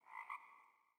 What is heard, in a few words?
sting frog; Rust